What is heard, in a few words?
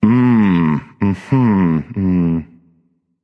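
non verbal thinking, voice; cernunnos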